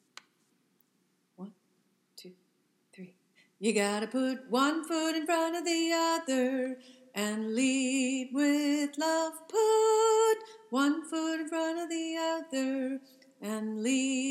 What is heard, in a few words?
soprano; freedom choir